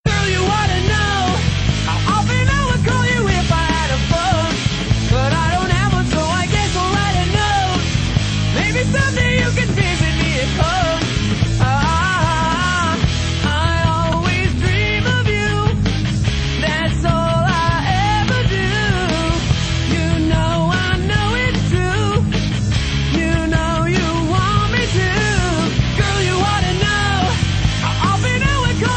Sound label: Telephone Song